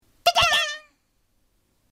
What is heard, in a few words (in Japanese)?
拍子木